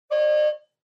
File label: Car Horn Fake